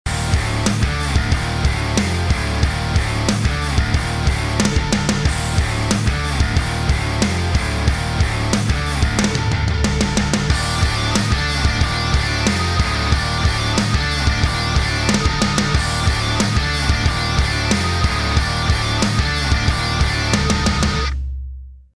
snare hi song; desert drums

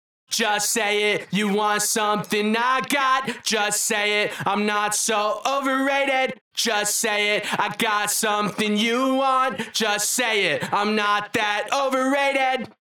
VOX MID LOOSE

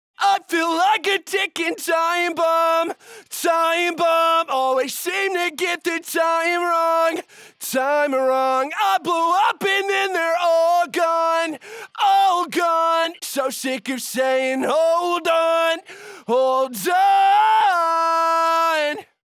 rock vocal off; dynamic tone shaper

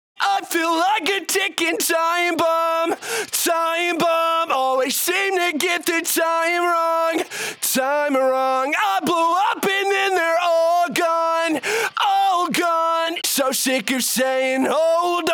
rock vocal on; dynamic tone shaper

